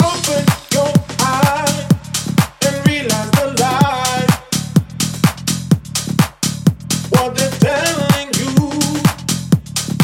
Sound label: Tech House; Tech House Market; Tech House Fundamentals v2; serum